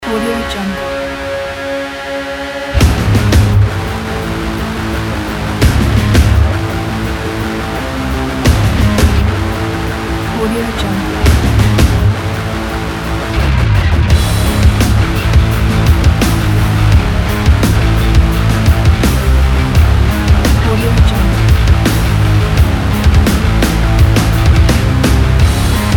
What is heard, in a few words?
aggressive rock action